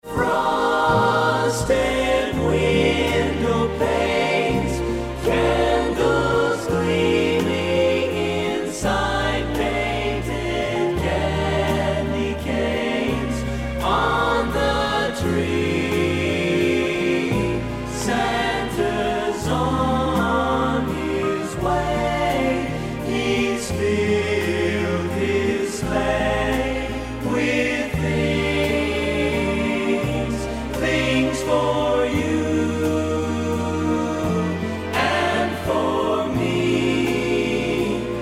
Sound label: Christmas Waltz